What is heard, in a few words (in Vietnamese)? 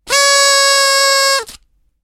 Tieng thoi ken party horn